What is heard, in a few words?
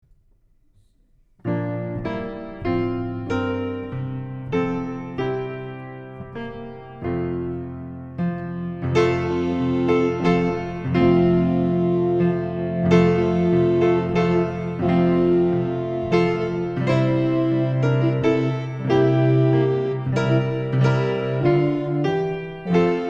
piano and organ, choir; Into My Heart